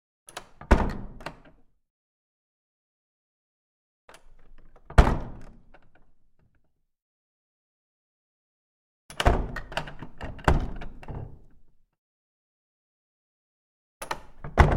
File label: Small Wooden Door With Latch; Hollywood Edge Historical Series; cd4 Castles Palaces & Monasteries